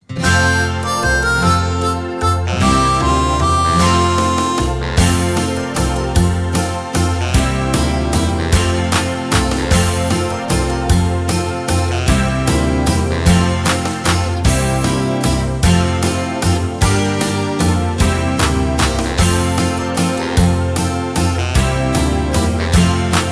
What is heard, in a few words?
recorder